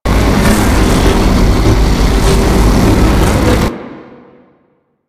Impale Vortex Loop; Warframe; Warframes; Hoplite

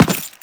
Run Default, Footsteps; Warframe; Teshin

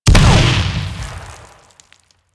Artillery Explosion; Enemies; Abilities; Teralyst

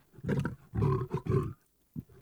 Wolf Growl Run; Animals

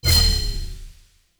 Glyph Platform Deactivate; Warframe; Duviri; Glyph Puzzle

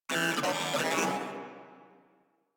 Moa Vocal Attack; Enemies; Warframe

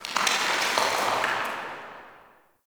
Alley Distant Rock Crumbles; Ambience; Dungeons; Under City

